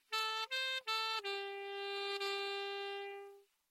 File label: saxophone solo slow